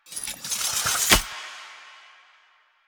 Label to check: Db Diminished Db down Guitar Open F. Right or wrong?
Wrong